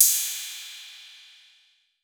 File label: 808, CYMBAL; Electronic; Wizoo - Platinum 24 Electronic